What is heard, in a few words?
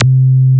Sub Sonic Bass C2